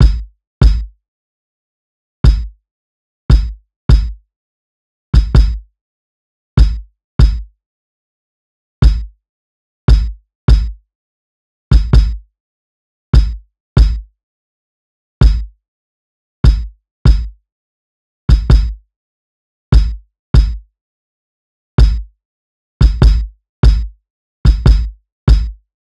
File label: kick 73, Kick Loops; Drum Sample Pack; SD SEISMIC; Slate